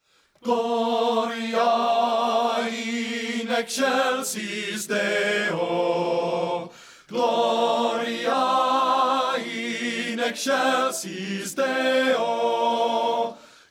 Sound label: GLORIA IN EXCELSIS DEO BASS 2; men; partbypart